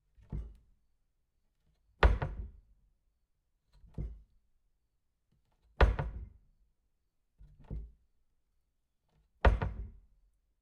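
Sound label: HOUSE Kitchen Cupbord open close Close Medium; Coll Anderson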